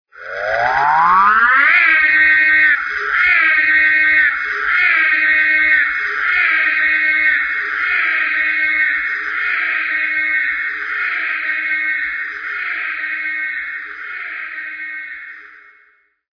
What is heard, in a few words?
Ecto Siren Start & Leave